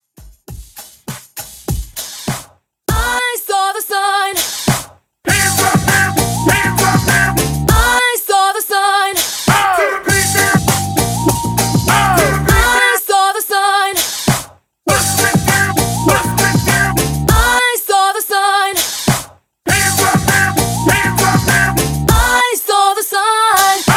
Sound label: Breakz Party, 100 BPM